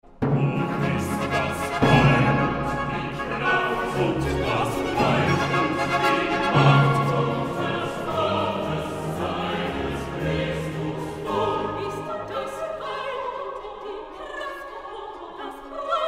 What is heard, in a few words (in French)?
cantate, choeur final; BACH; bwv 50